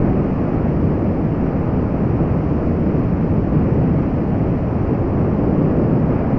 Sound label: Aircrafts Plane Wind; DCS